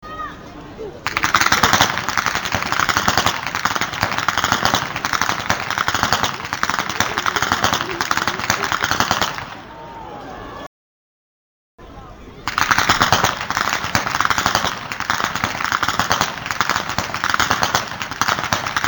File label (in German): schnalzen, jugend; schoenram